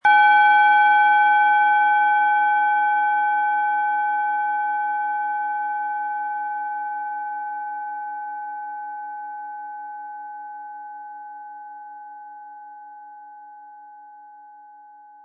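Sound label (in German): Planetenschale Mars mit Klöppel; 260-320 gr.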